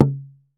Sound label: COCHITI, American Natives; E-MU Formula 4000 Series Vol. 4; Earth Tones